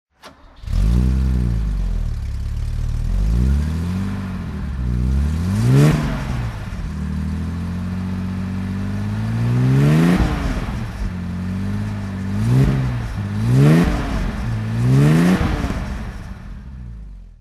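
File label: mercedes 250SL pagoda throttle, engine sound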